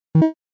Futuristic error

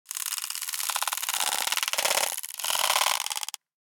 Insectoid creature alien clicking

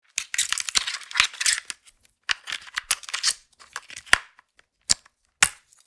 Remote TV controller changing battery; appliances

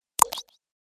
Pop up bubble notification

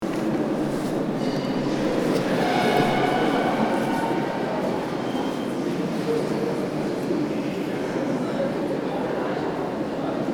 Underground metro hallway passage loop; station

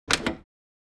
Old car door opening

